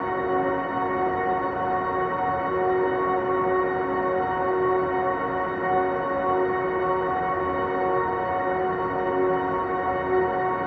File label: 90 F# Piano Ambience, New Age Ambient; Loops; Spring